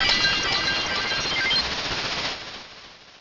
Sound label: Flash Cannon; pokerogue; battle anims